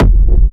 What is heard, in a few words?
Industrial Techno Kick oneshot F, Nicely shaped, crispy and reverbed industrial; kick drum